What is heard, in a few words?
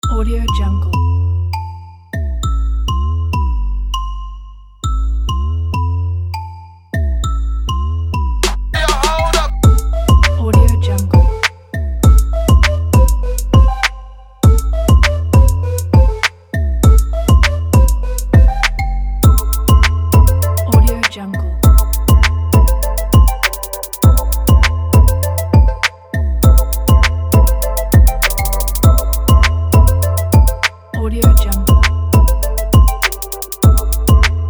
hip hop 808